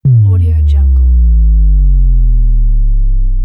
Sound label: bass drop